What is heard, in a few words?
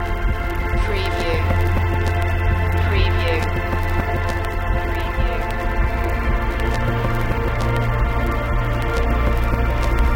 freescape vinyl